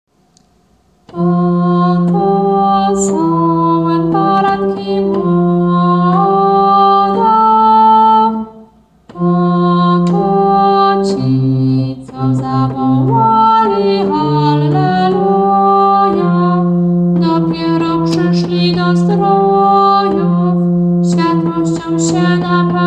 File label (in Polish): bas, Wielkanoc; Oto sa baranki mlode